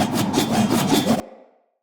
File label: Great Sword Throw Loop; Weapons; Player; Swords; Mendicant Great Sword